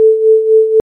volume sine 4hz

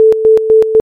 volume rect 4hz

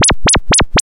freq sine 4hz

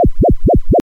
freq sine 4hz

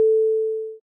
enveloped sine

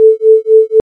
volume sine 4hz

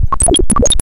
freq random 64hz

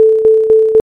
volume saw 6 step 4hz

